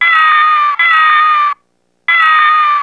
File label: cat